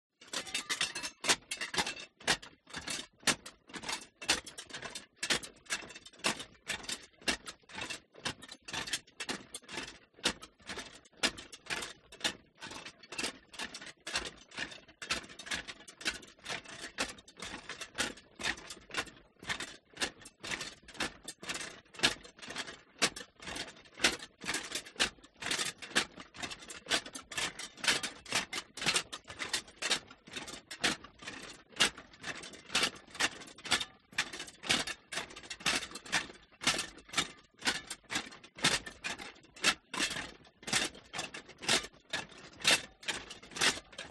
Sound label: ARMOR Troops Metal Clatter March; FOLEY; smartsound